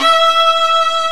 ARCO VIOLI; E-MU Producer Series Vol. 2; More Studio Essentials; Composer; Solo String Combo

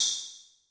Dance Kit, Korg 01W

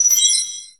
DOOR RING, Anime Sound FX, SCIFI

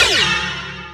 Sample, Roland MV8800, BREAKWILDKIT

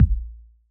hip hop kit kick

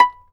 fiddle pizz B5 L; Sampleheads; Whole Lotta Country 1 & 2

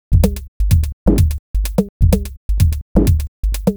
Top C 127bpm, House Drumloops; 90 s Sample CDs; Zero-G House Fabrik Drumloops; ZG Drumloops

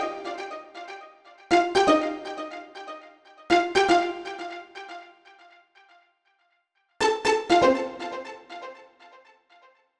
Pizzicato Tail, 120bpm; Construction Kits; Zero-G; Nu Disco; Nicengroovey C

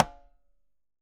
Congas Tumba slap; Best Service; Festa Latina VOL-1; Single-Hits

